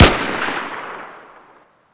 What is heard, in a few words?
magnum fire; weapons; 44magnum; garrysmod